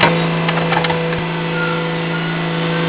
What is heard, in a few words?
elevator, doors